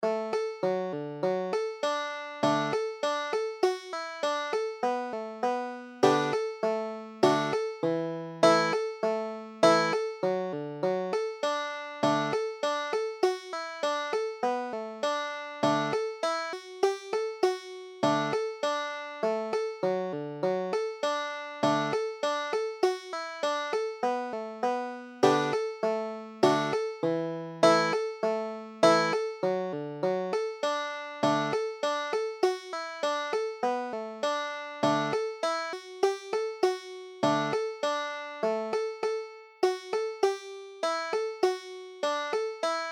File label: banjo